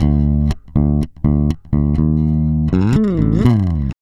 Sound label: REAL BASS, BASSLICKS; Ueberschall; Jam Box